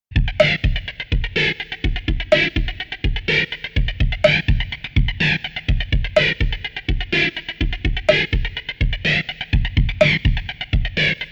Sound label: Drumtraks Jet Rhythm